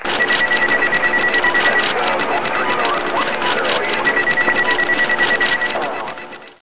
beeps

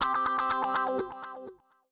ELEC GUIT, House, Guitar; eJay

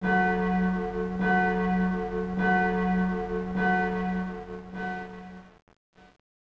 bing bang; CRC 2005 Demo

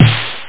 snare

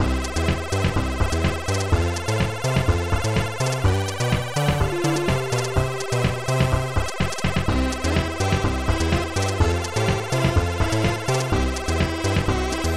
calypso

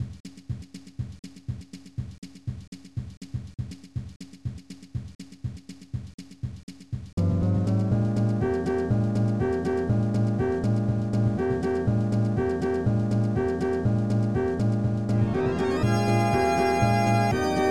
contented blues; OctaMED SoundStudio V1; mug-members

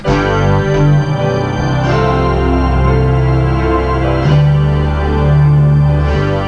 Bass and Rest; MIDI Primer 1.0

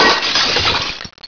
glass win; Pamela Anderson Interactive

